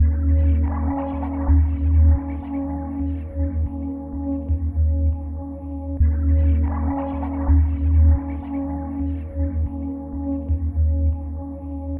Ice clouds, Pads; Sonic Foundry (Sony Creative Software); Synchro Funk

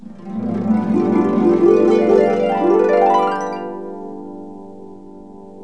HARP GLISS

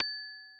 percussion, Millenium MPS-400

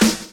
DRUM CHOP; AMG Gota Yashiki Groove Activator

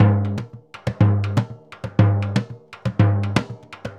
SURDO, DRUMS SAMBA

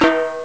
gong; ST-XX Amiga Soundtracker Sample Packs

